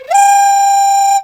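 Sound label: China flute; Spectrasonics; CHINAFLT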